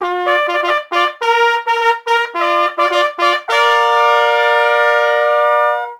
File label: fanfare win